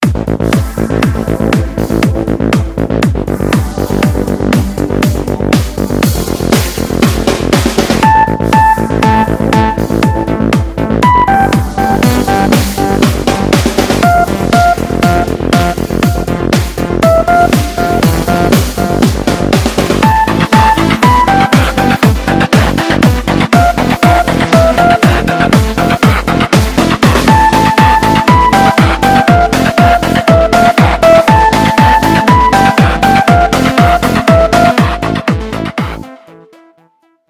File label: Trance, ringtones; Coolpad